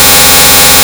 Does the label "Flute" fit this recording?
No